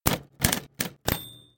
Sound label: Typewriter